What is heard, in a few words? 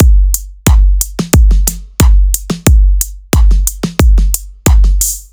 House beat C, ударные и барабаны, электро джаз; 130 BPM; Drums; Electric Jazz; loop